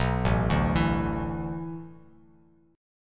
A Suspended 2nd Suspended 4th, Bass Standard

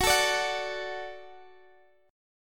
Gb Minor Sharp 5th, Guitar, Drop D; down; chord shape

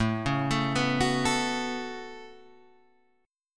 A 6th Add 9th A down Guitar Standard; arpeggiated; capo=4

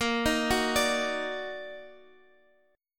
D sharp Minor Major 7th A sharp

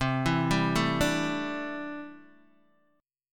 C 6th Add 9th C down Guitar Open D

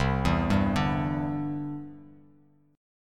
C-Major Add 9th; arpeggiated

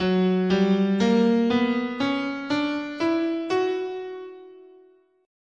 F# Phrygian Dominant, scale

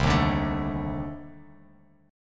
C Minor 6th Add 9th C Bass Standard; Cm6add9 strummed; JGuitar

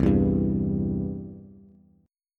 C Major, C, down, Bass; strummed; chord; JGuitar

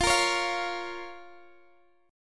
F Suspended 4th Sharp 5th F down Guitar Open G

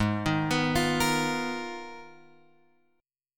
G sharp Minor 6th Add 9th; G#m6add9 Chord {4 6 X 4 6 6} arpeggiated

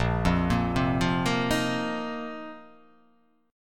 A sharp Suspended 2nd Suspended 4th down

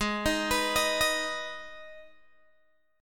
G sharp Minor, Guitar, Open G; x,13,13,16,16,13

